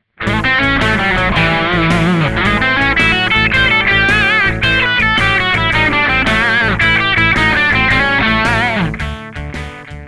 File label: blues licks